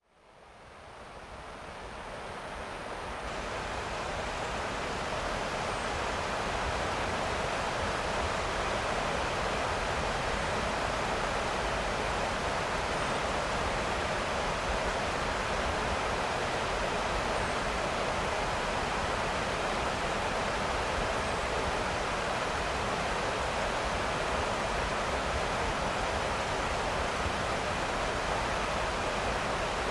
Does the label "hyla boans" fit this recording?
No